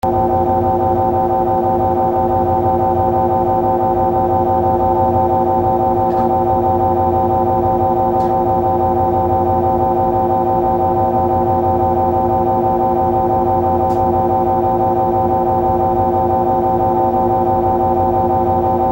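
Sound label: MRI tomography sounds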